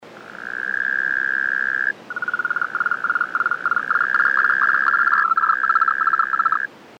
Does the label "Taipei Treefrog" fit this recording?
Yes